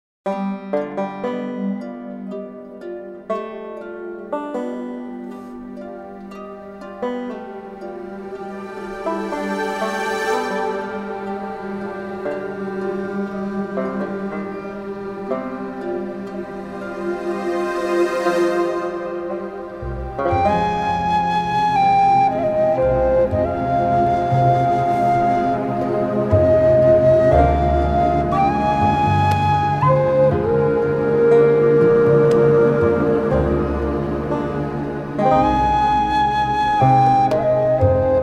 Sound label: outdoor wilds; wakingshore